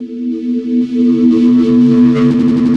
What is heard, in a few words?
Fenno Swell; KJ Sawka - Drum n Bass & Beyond; Swells